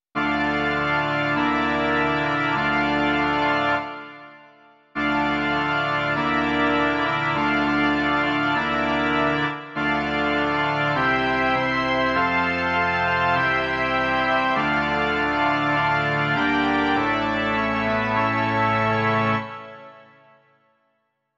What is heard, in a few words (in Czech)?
varhany; Varhanní verze